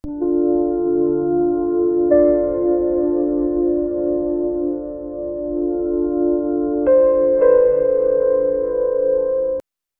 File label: melancholic intimate soft, ambient textures